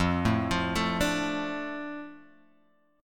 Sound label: D Minor F, chord